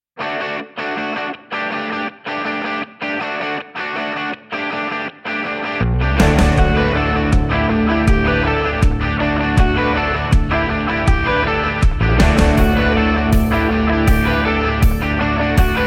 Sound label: parebrise version rock